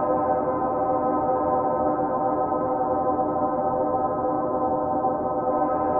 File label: InfiniVerb Voice, sparse soundscape Loops